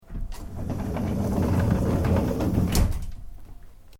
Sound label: Door Factory Metal Sliding Close, Industrial doors